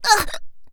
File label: die; Eternal Return; Tia; ENG